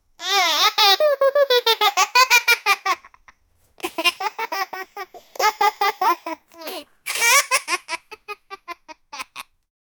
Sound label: child laughing normally; happy